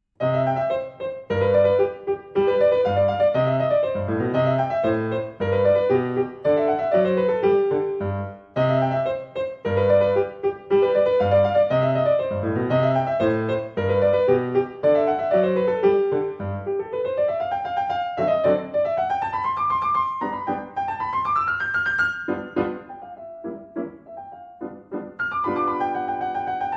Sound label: Modern Sonatina Allegro, Piano